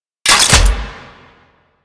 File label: Shotgun Mech; Warframe; Veilbreaker; Kahl